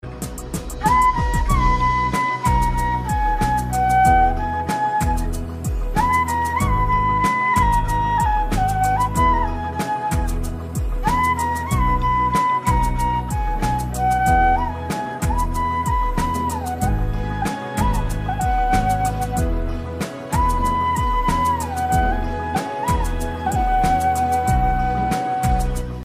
Flute Ringtone; Kabir Singh

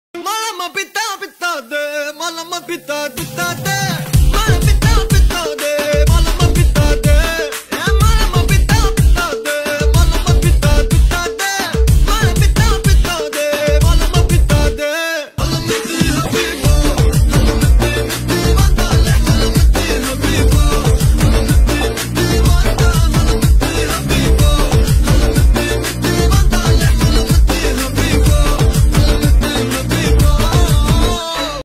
Ringtone; Beast

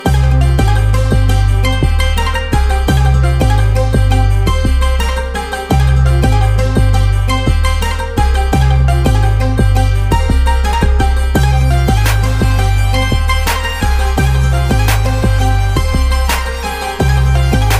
Ringtone BGM Bass Boosted Remix; Beast

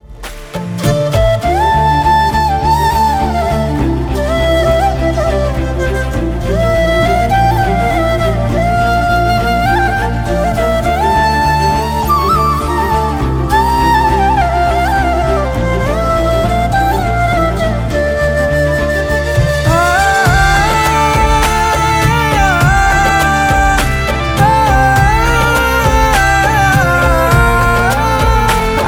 Humming Instrumental

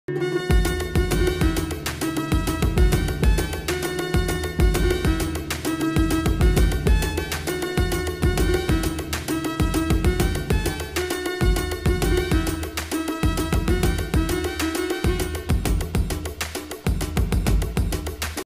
ringtone instrumental ringtone BGM ringtone; Pooja Hegde

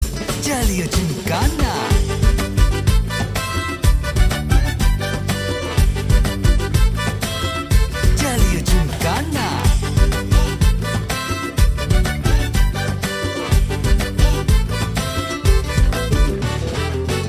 Instrumental Ringtone

